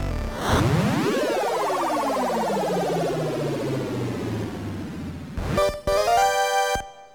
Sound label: Warp ~ Challenging Stage Start